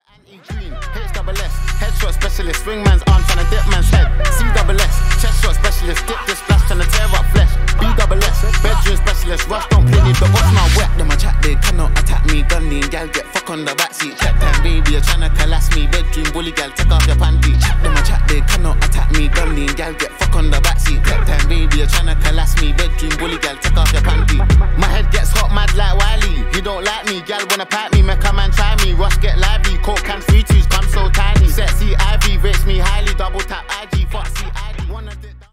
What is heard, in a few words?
hiphop